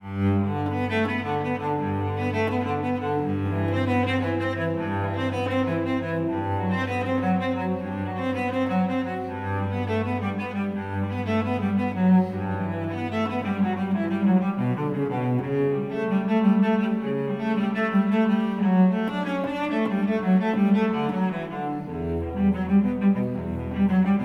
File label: Bach Cello Suite; Samsung Galaxy-A57; Calm